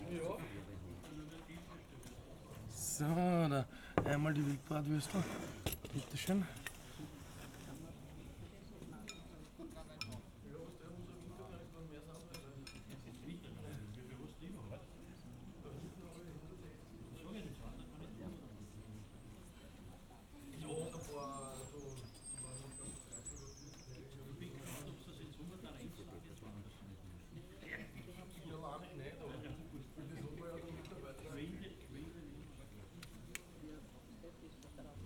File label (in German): Gasthaus; Lexikon-Roman